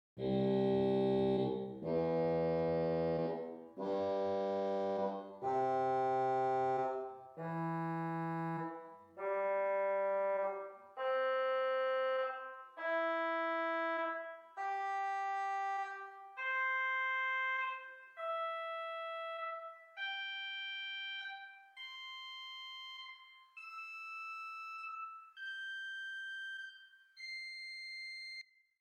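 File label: Swell Oboe 8 arpeggio, organ; St Annes Moseley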